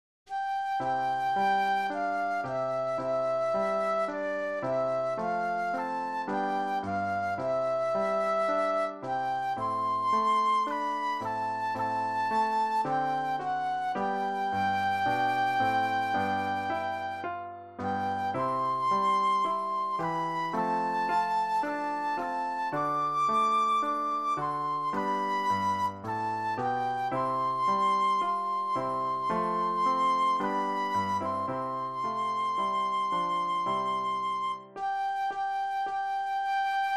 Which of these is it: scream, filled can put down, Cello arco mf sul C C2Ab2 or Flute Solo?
Flute Solo